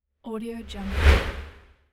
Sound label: air swoosh